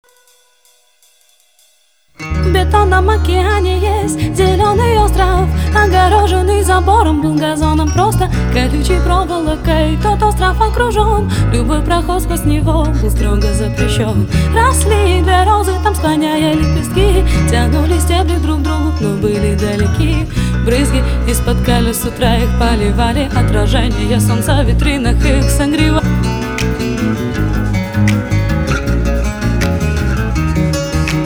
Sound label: acoustic version